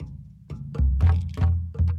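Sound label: Calm Far, music; from dust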